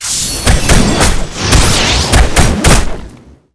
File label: Weapon Blast; Seer; GamigoNA